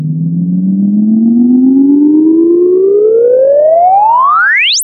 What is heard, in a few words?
Sweeps Up, Effects